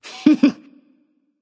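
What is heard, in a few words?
heh heh, female; smite; voicepack; hera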